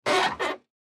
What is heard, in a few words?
Wood Creak, Wood Creak Fast; Foley; Blastwave